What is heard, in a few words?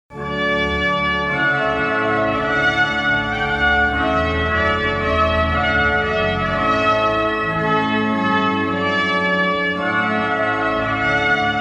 Trumpet Voluntary; casamentos; Cerimonia